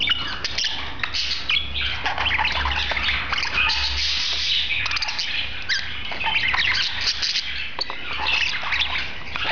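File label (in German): gesang fuer partner, wellensittich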